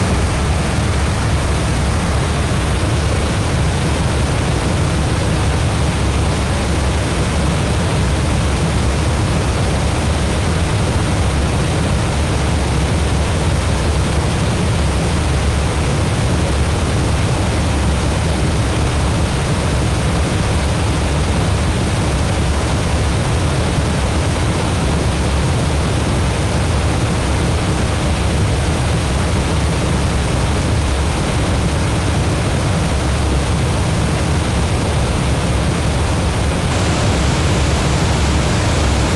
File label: water dam white noise and bass rumble closer perspective stereo; Sonniss GDC